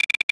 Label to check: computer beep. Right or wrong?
Right